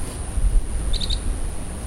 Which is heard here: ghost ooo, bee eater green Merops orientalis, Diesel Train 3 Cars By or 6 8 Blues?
bee eater green Merops orientalis